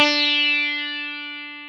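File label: ELECTRIC SITAR D3; Jones Elec.Sitar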